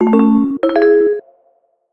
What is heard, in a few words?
ring; matrix-react-sdk